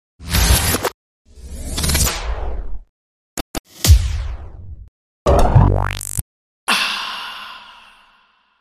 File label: Outburst Slideout Clicked Squished Aaah